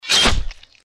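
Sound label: deathclaw claw attack; npc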